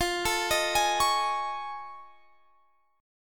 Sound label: F 7th Sharp 5th Sharp 9th F; arpeggiated; chord; JGuitar